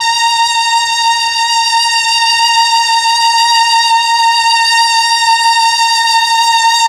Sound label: MEL STR, Legendary M400; ILIO; Propeller Island